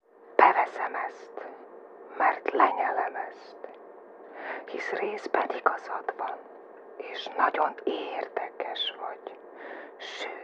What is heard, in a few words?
best of disco